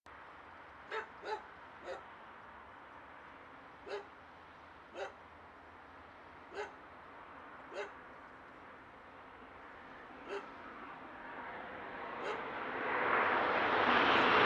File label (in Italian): Treno mono; Eigenmike; A-weighted; Nuova Strada